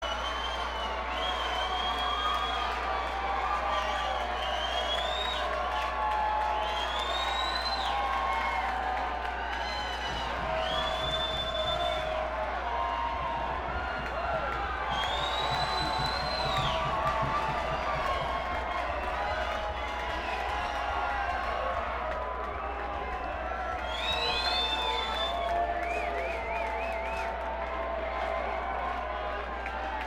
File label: Banter Crowd